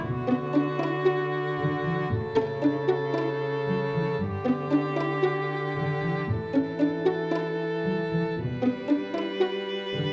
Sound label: String Test